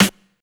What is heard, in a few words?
SD, Hip Hop R n B; AKAI; USB Soundscan vol.19